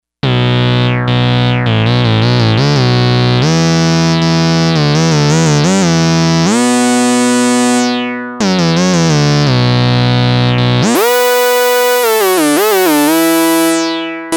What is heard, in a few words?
Minimoog Sound; THAT MOOG® SOUND